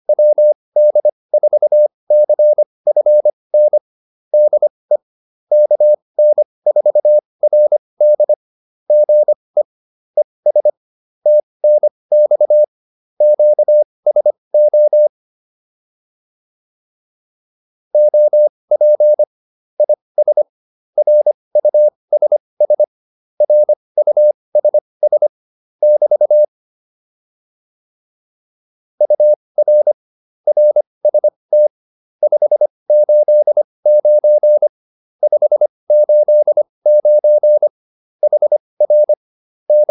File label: QSO, intermediate practice files